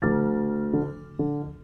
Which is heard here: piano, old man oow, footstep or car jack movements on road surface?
piano